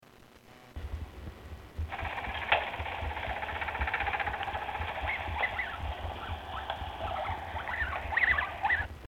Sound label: rumble, abyssinian guinea pig